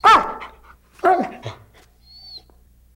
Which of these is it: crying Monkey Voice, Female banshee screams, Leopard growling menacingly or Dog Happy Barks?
Dog Happy Barks